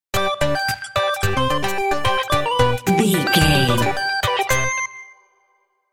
happy video game level stinger

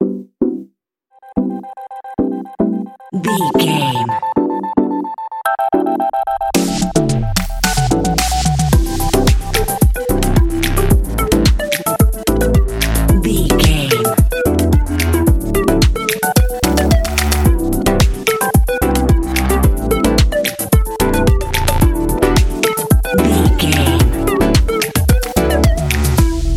digital joy

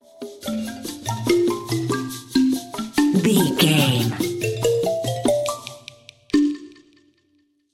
rainforest expedition stinger

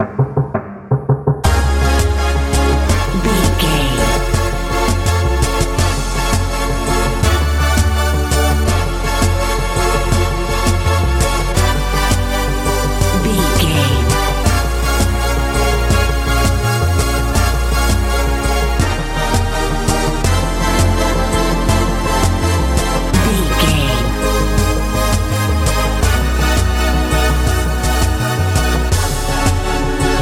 powerful warm lucky rabbits foot life dance

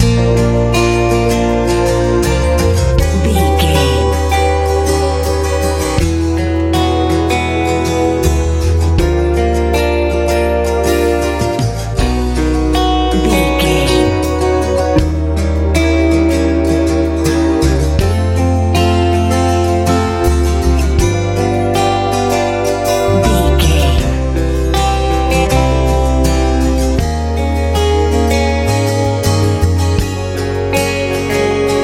ballad

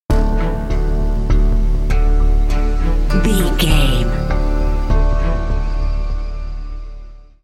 building worry and tension sting

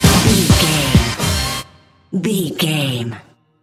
sting

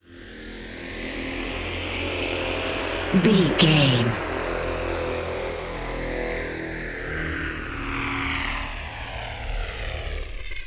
ominous war plane

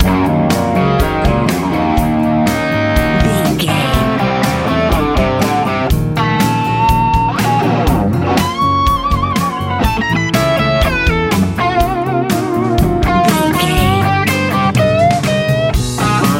powerful big nice airplane ride life easy rock

